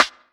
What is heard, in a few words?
good snare D key, steel snare drum sound tuned to the D note. Loudest frequency: 2142Hz; snare drum samples